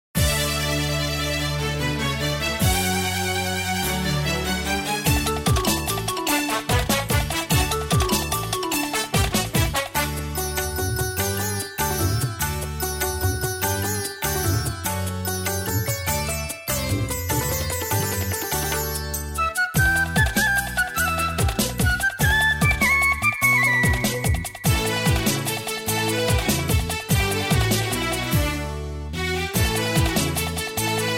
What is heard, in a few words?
X'an Devotional